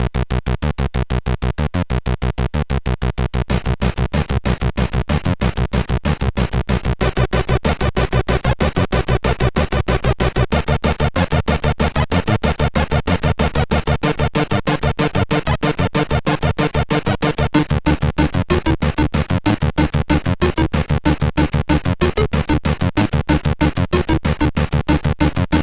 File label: Techno, sid CSG8580R5; hvsc